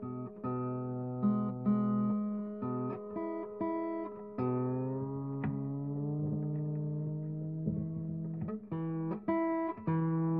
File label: Guitar Muffled; nancy drew danger by design windows gamerip 2006